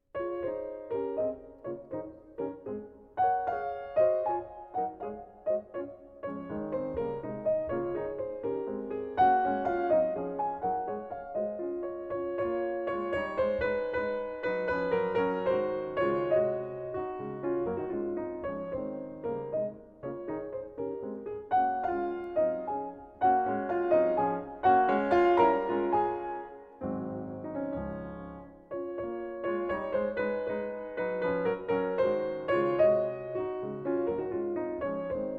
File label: Piano Sonata No. 14 In C-sharp minor, Op. 27, II Allegretto; Moonlight